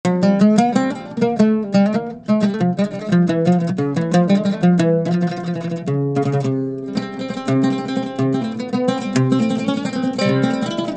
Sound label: Ud solo